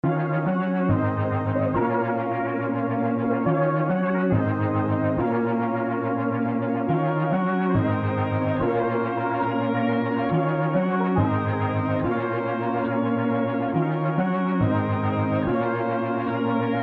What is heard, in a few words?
140 Bb minor synthy